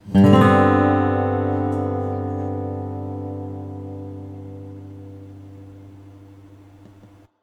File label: chord not good; beacon pines